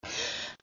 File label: Breath; Lewd SFX